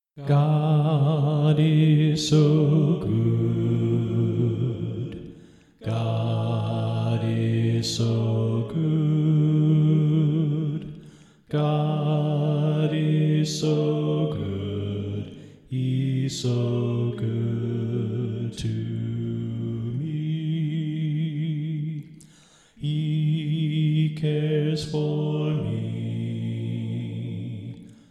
Bass Left

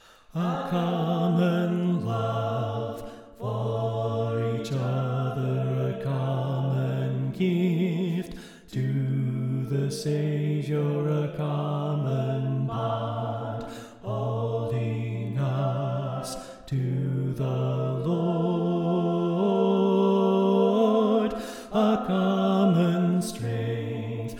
Bass Left

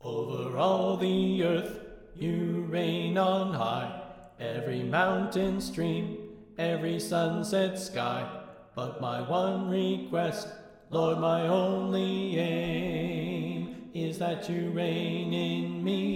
Bass Left

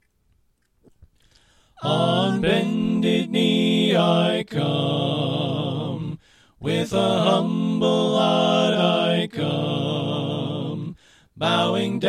Tenor Right

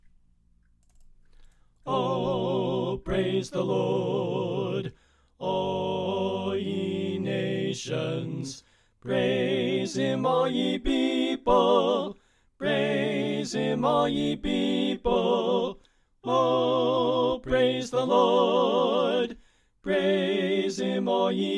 Tenor Left

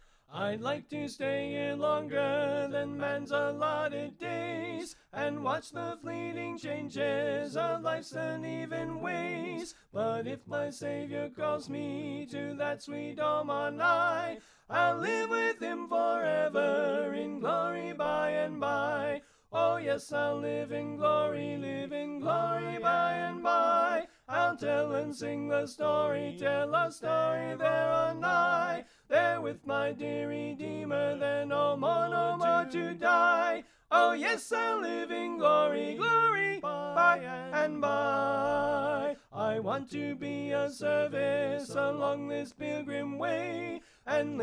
Alto Left

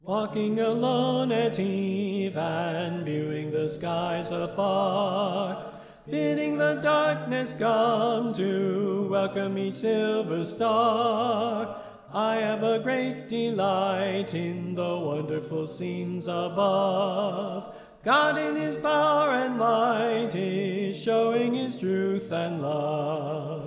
Soprano Left

